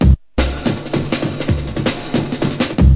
breakin snare action, drum loops and breaks, 161 bpm; 161 to 180 bpm